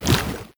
tarmonster chase; Crypt of the NecroDancer

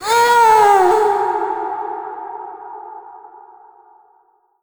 death; Crypt of the NecroDancer

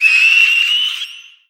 banshee death; Crypt of the NecroDancer

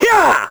melee; Crypt of the NecroDancer

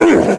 dorian hurt; Crypt of the NecroDancer

